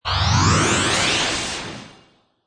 Magical Ascend Burst, Magic sound of casting spell, conjuring or wizardry; Burst Sound Effects